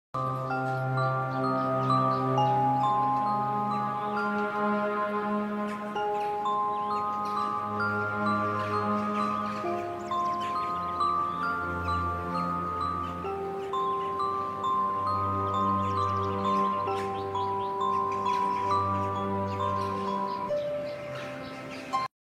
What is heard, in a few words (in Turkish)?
Enstrümantal fon müzik; Kadın